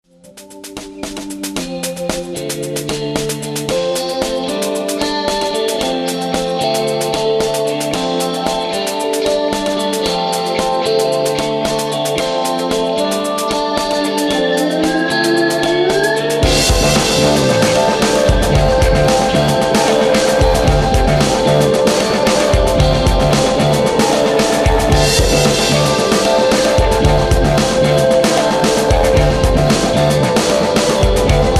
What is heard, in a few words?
strat